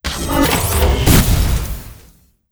boss satk; steamer crazy

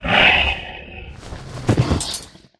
felidae dead, monster

